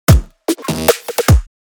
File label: drum sms; рингтон